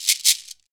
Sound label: SHAKER, Afro Shakers, Shake&Rattle